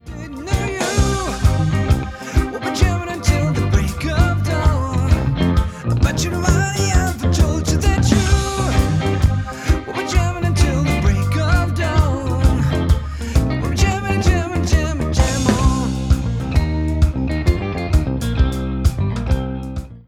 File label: jammin